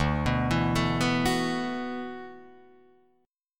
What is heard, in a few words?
D 6th Add 9th D, Guitar, Drop D